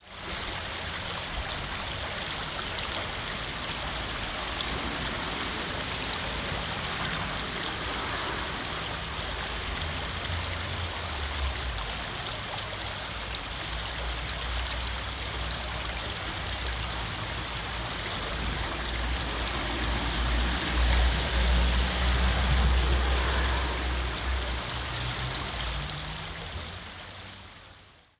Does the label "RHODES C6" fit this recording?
No